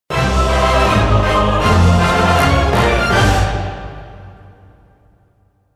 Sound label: orchestral fanfare logo